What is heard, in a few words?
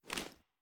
ads up, reloads; gmod